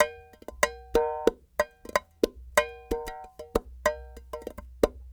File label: clay pot, world percussion